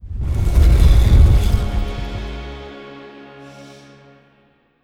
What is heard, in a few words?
free Spin To Main Game Transition; netent